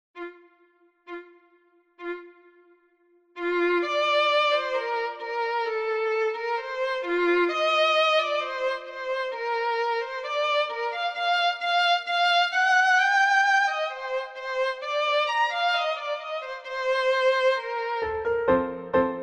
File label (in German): Playback Liebeslied Violine; Beethoven